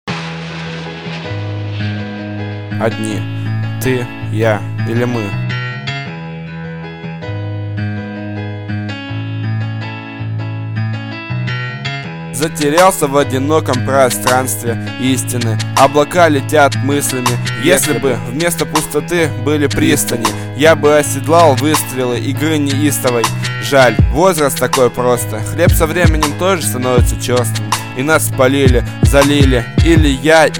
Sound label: instrumental